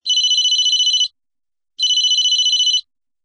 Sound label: Ericsson high